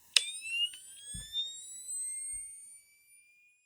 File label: powerup